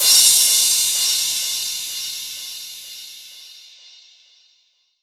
Crash, 128 BPM; Rap; Vengeance Samples; Vocal-Hook Kits; Song Kit; Let's Move

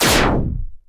Lazers

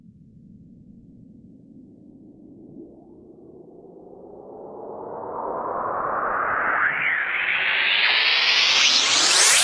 Sweeps Long

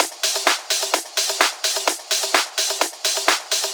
House Loop, 128 BPM; Vengeance Samples

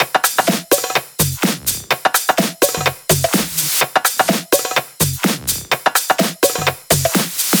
Kit 12, 126BPM; Purple Kit; Vengeance Samples

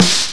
snare; Breaks N Beats Sound Kit; DnB Kit 2

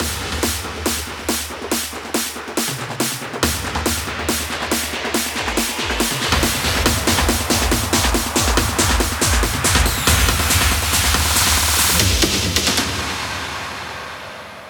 140BPM 8Bars, HandsUp & Trance Fills; PerfectLife; Vengeance Samples

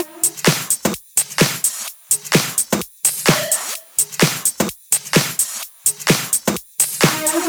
128BPM Dubwise Kit

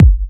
Bassdrum; Vengeance Samples

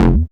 Offbeat Bass F; Vengeance Samples

